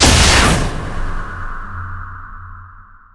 wight attack; netherward; skbt astro mothership

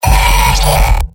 m pain severe; pyro; tf2; garrysmod; mvm

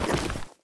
push; tf2

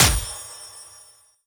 medal bronze

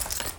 taunt equipment gun; Garry's Mod; tf2

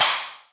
syringe gun reload air; tf2

